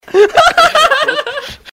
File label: hahahah